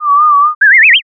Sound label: uguisu